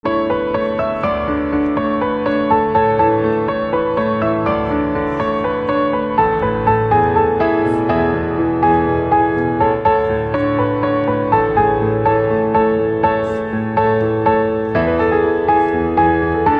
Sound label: Piano Instrumental Ringtone